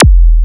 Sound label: Chunk, Drums, Industrial; Sonic Foundry (Sony Creative Software)